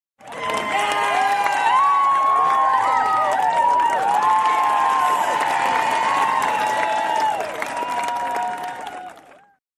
people clapping and laughing; کف زدن و خندیدن مردم

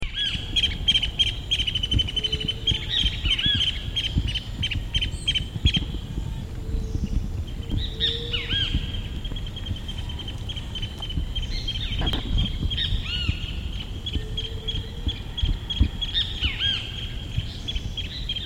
Pearl kite; پرنده کورکور مرواریدی

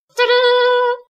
Tada

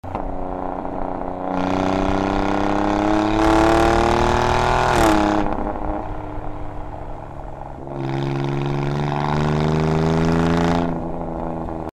Alfa Romeo exhaust

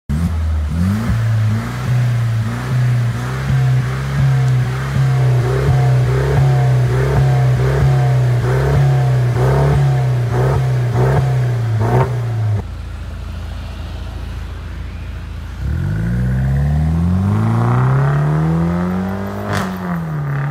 Benz C200

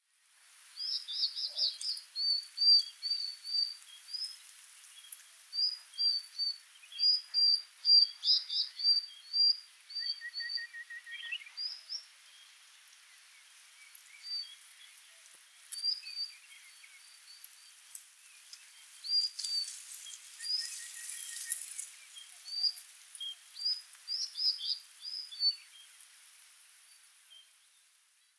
Jerdon's bush chat

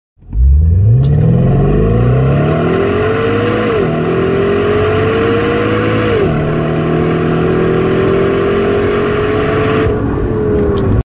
Fownix FX

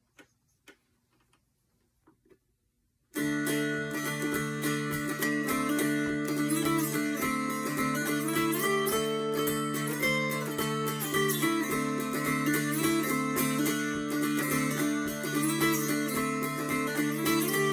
Appalachian dulcimer

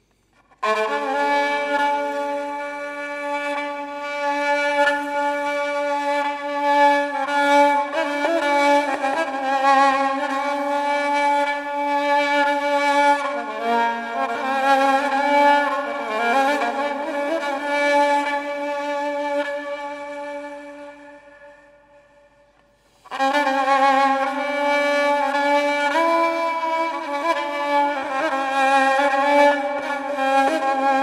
fiddle

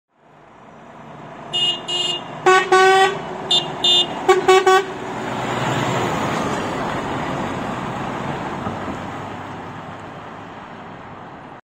Volvo 500 horn; ولوو ۵۰۰

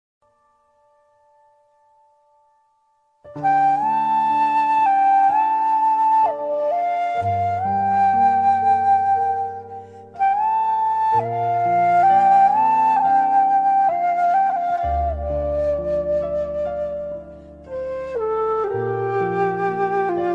Japanese meditation; مدیتیشن ژاپنی

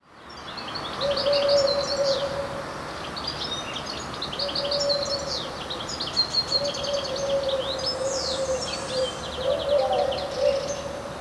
Eurasian collared dove

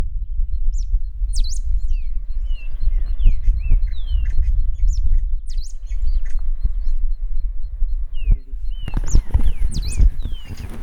Serra finch

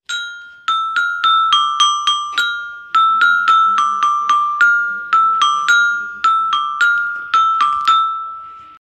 Metallophone

